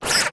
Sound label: Robot Oneshot; BedRoom